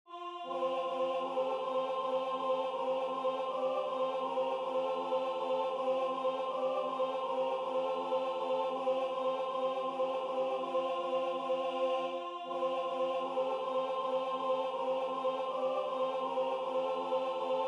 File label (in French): tenor